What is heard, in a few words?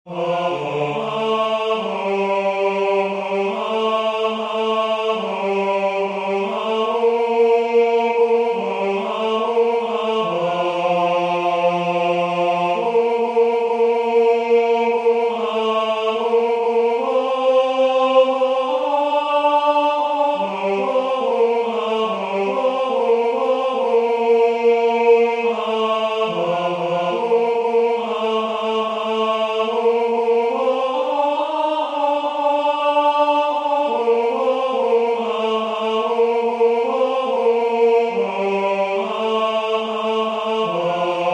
AN IRISH BLESSING Tenor